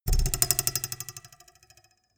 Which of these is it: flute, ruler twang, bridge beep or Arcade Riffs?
ruler twang